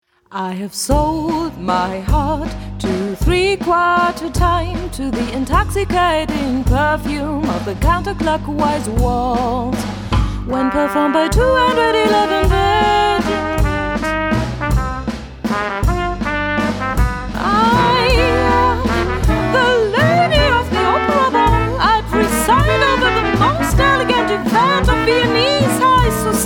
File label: Waltz